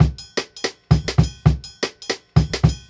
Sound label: Jungle Breaks